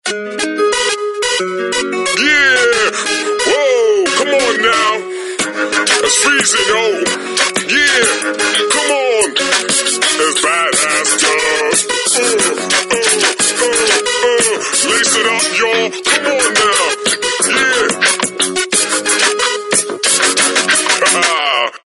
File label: Hip hop Guy, phonetones; SGH-U800E SoulB